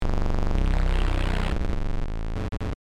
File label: world switch